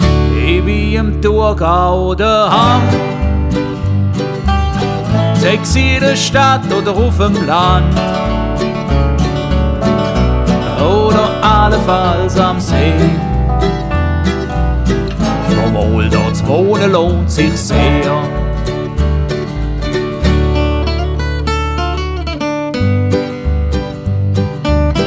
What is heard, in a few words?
Anthem; Thurgau